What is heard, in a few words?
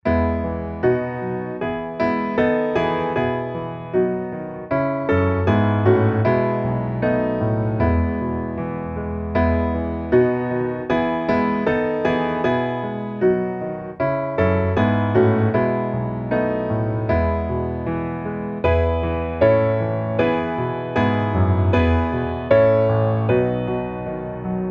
piano